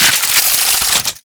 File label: Ink Rifle Alt Mech, Weapons; Warframe; Tenno